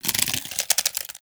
Armadillo Foley Scales Roll; Warframe; Characters; Hunting Critters

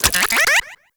Lure Move; Warframe; Teralyst; Vomvalyst Lure

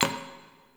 Idle Heavy Hoof Stomp; Tagfer; Warframe; Ambience; Entrati

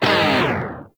Proj Small Fire; Warframe; Lizzie

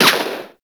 Rifle Fire; Warframe; Caliber Chicks Minigame

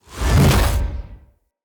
Spear Whoosh Heavy; Weapons; Enemy; Spears; NimrodSpear

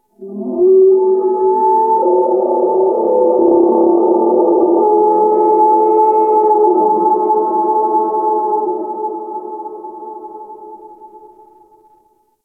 Ice Creature Distant Cry; Warframe; Corpus Ice Planet

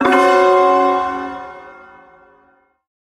Shawzin Hirajoshi Chord; Hirajoshi Chord B; Warframe; Duviri Ersatz Shawzin; Chords

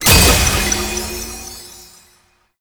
Delivery Key Deliver; Gameplay; Warframe; Nineteeen Ninety Nine; PvPvE

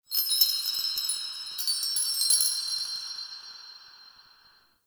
Metal Chimes; Warframe; Ambience; Nokko Colony

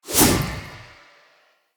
Sphere Polearm Throw Perfect C, Weapons Player

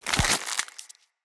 Root Spider Foley Jump; Animals

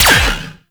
Laser Fire; Warframe; Corpus; Ambulas

